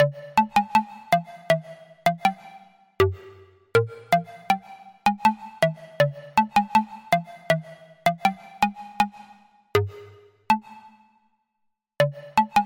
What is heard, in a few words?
Pluck; Routed Sound; Sylenth1 Expansion - Story Walker Vol.1